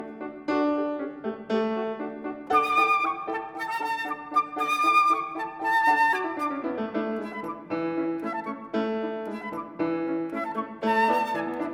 Beethoven Serenade Op. 41 in D Major Allegro vivace